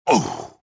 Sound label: land; reborn